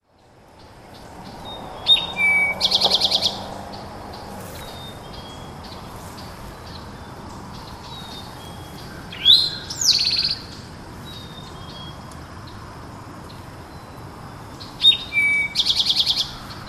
Mystery Bird

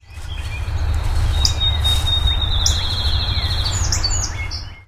field sparrow

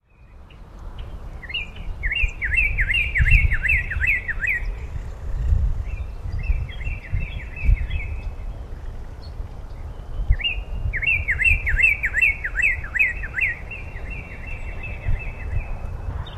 two cardinals 2 sounds

